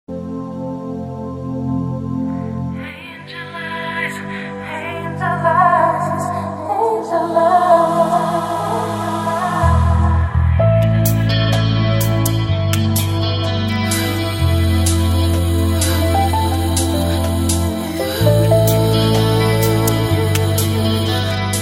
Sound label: Ambient Chillout; Ringtones